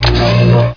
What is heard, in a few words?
magnet